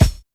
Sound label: kick, Kicks